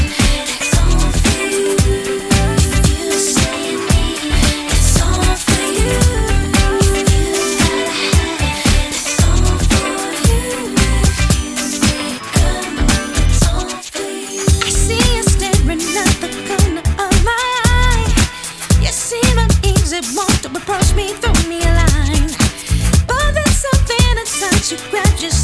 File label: pop